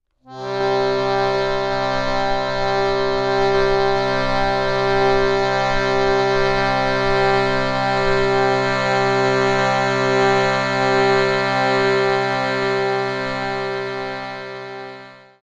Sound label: Shruti Drone C G C